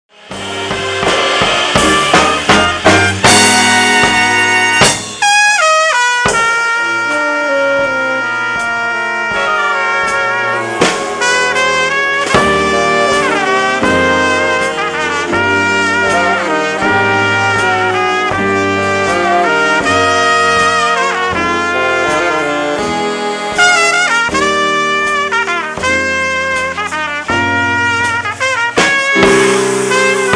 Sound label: Golden Horn